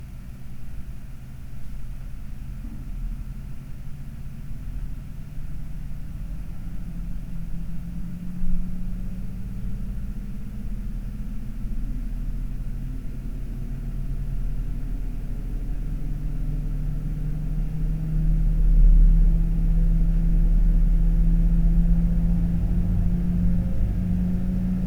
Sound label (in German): Langzeit-Case-Fan